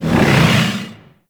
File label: Moon Loot Room Elevator Stop; Warframe; Orokin Moon; Ambience